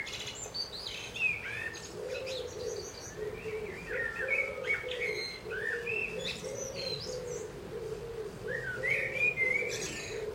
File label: bird song loop; koertes